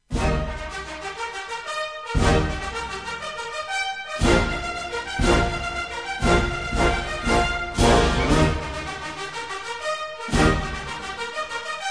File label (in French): trompette amoureux; Le Trompette Amoureux - polka march; Léon Walpot